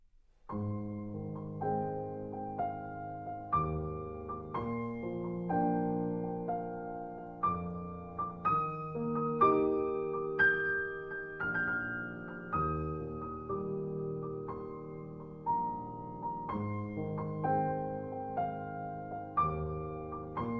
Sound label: Piano Collections